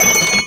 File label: wheel stop; Amatic; Grand X